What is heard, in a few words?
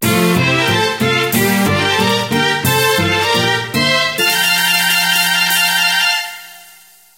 Happy; silver screen story; android